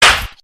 slap

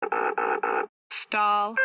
stall warning

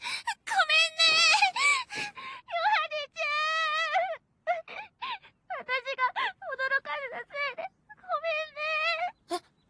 crying; yohane chan

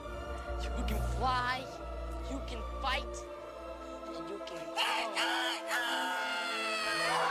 crow; peter pan

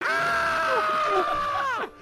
scream

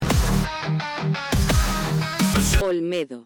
jingle

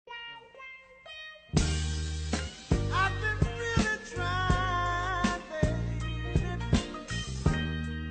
romantic sound effect

